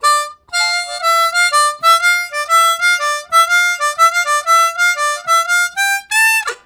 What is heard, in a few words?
Round, Harmonica Riffs; Zero G